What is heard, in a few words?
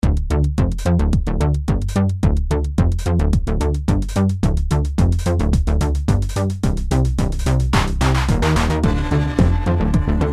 re909